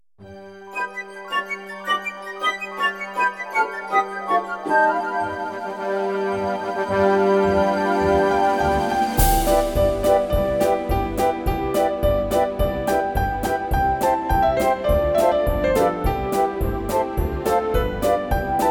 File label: Holiday Orchestra on Keyboard